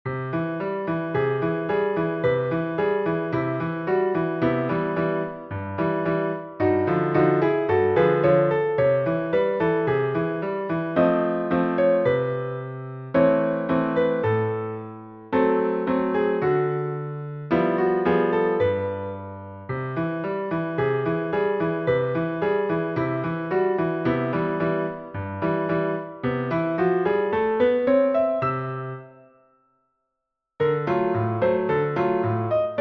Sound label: piano lesson